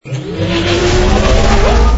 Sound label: energy on; alienshooter